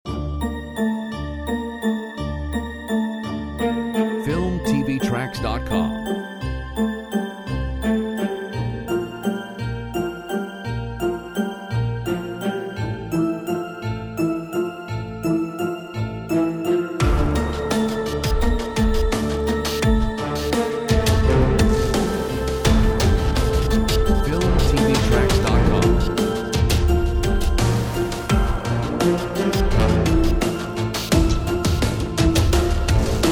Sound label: Waltz